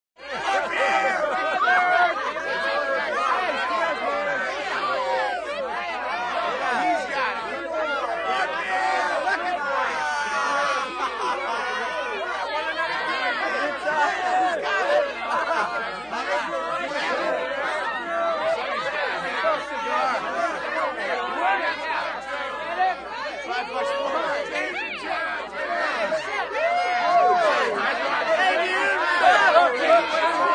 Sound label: Crowds; 헐리웃특수효과음; 군중-혼잡